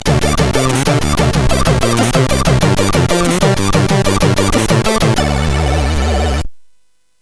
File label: Game Over, sid; CSG8580R5; Future Freak; hvsc